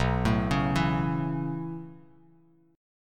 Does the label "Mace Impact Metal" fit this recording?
No